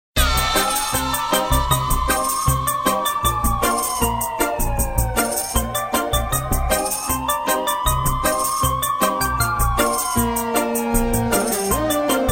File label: instrumental